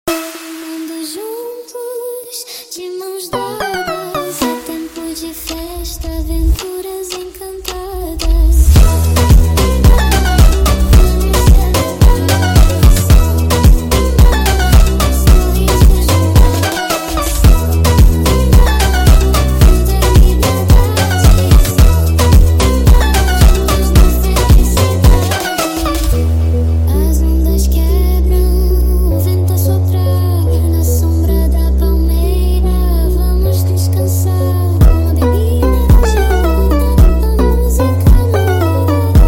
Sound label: speed Up, funk